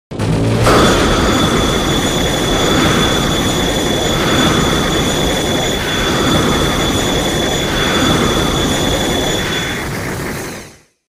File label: beam; destroyah